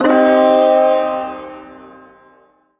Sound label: Shawzin Blues Chord; Warframe; Duviri Ersatz Shawzin; Chords